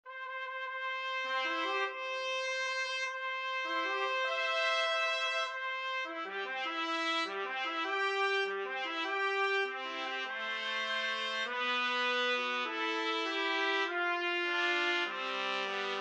wedding march duet trumpet duet

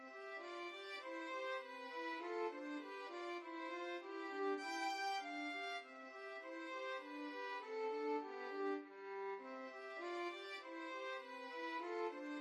melody violin duet

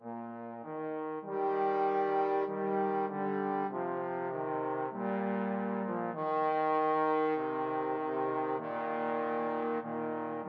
trombone duet

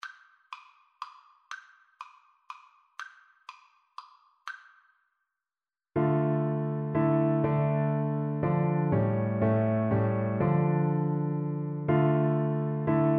we three kings Euphonium

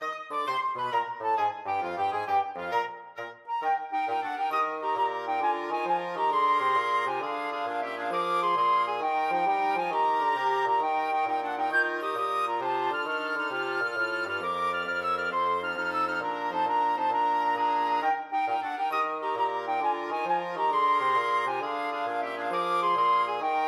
flexible mixed ensemble, 4 players

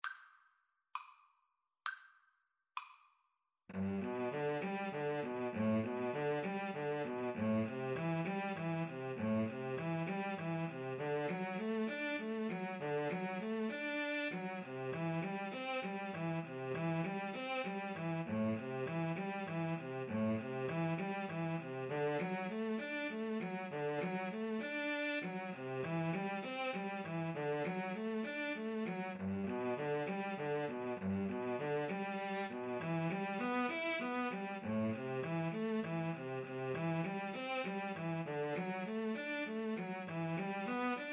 for Violin-Cello Duet; as featured in the film Robin Hood

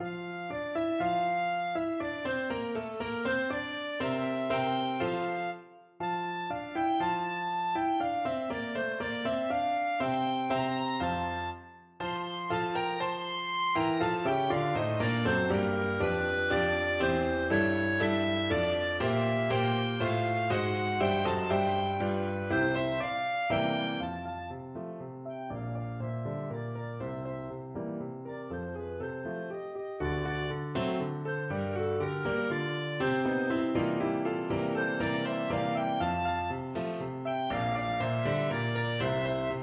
la gassier, clarinet

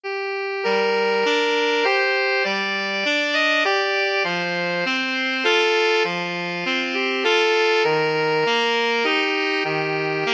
greensleeves alto saxophone duet

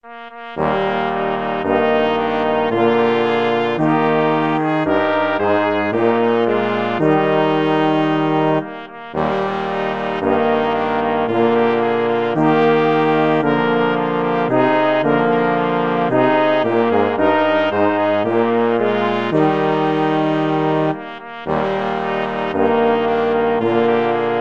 brass quintet

